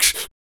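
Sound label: vox percussion, Vocal Planet Jazz FX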